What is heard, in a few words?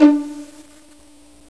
VIOLIN D; MUSIC